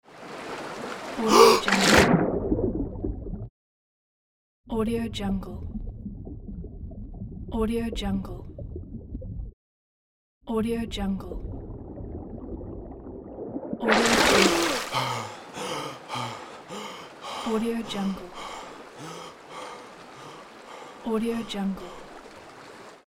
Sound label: man submerging then emerging from water